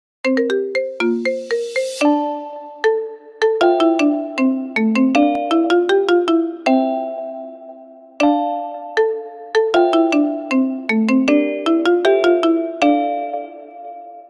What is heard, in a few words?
anime ringtone iphone tone remix bgm